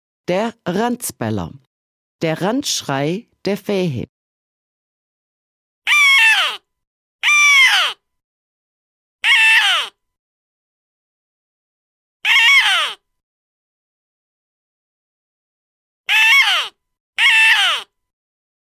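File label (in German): der Ranzbeller, der Ranzschrei der Fähe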